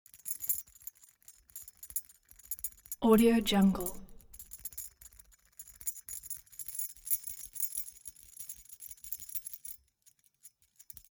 object key key ring small rattle air sequence soft